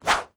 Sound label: Swing Miss; Disney Star Smash; Android, iOS, Mobile